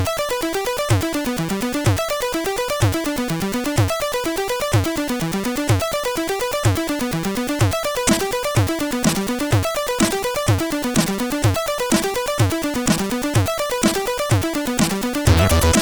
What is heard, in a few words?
Intro music, CSG8580; MCG Intromusic; hvsc; FAME; Hendriks Michael